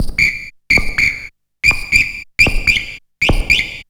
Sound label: BIRDY 160, Hard & Loud Techno; USB Soundscan vol.01; AKAI